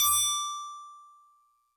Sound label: Tubular Bells DX100 D6